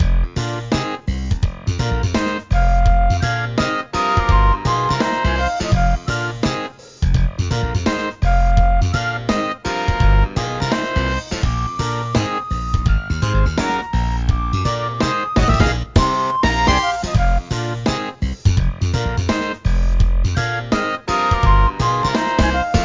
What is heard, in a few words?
Clavi Bass Cym Drs Horns Flute